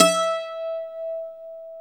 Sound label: BOUZ PIFO E 3